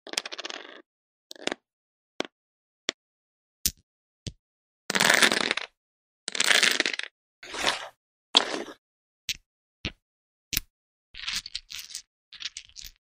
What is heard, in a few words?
Domino